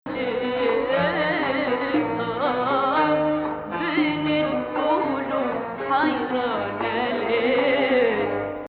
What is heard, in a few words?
Rast, maqam